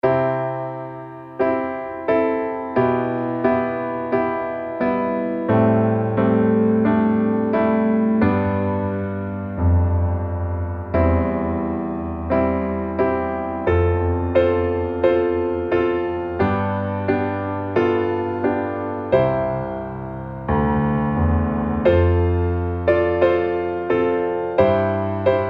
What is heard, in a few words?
yuyakekoyake piano